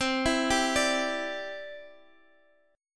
C Major Add 9th C down Guitar Drop D

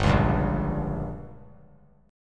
G Diminished; strummed; JGuitar

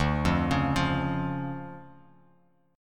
D Minor Major 7th, D; DmM7 arpeggiated; JGuitar

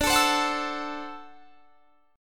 D 9th D down; strummed

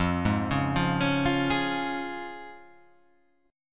F Minor Sharp 5th; x,8,6,6,5,8,7,5

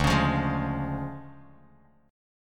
D Minor Major 7th Flat 5th D Bass Standard; 10,16,15,13; DmM7b5 strummed

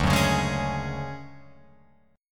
D Major Sharp 11th D Guitar Open F, chord; strummed; JGuitar

